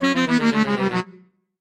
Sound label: Music Saxophone Descend